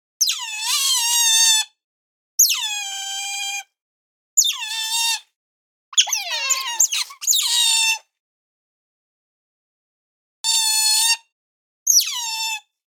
Otter Whistle Call

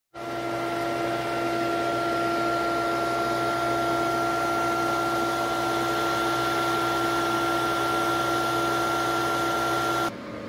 bitcoin miner

